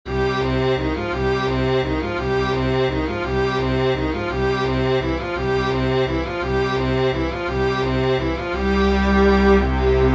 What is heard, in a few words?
string quartet